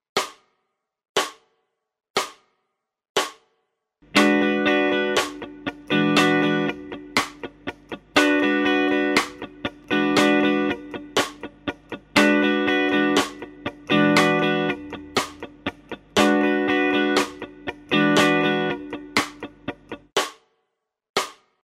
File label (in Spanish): ejercicio semicorcheas acorde 3 4